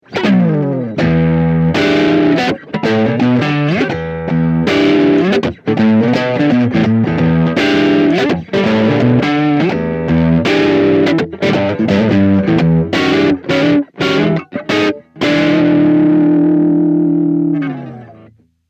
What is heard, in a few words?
Neck Channel